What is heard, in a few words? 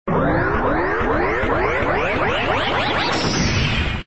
Key Drop; BowserKeyDrop; Super Mario 63